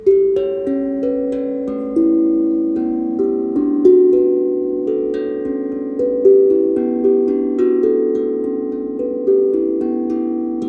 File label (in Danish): Mellem tromme